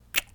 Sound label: kissing; AfterStory; Doki Doki Literature Club